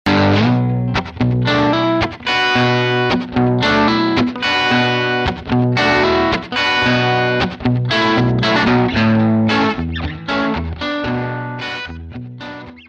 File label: Hollowbody Overdrive